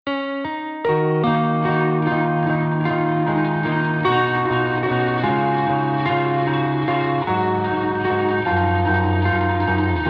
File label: Drum Samples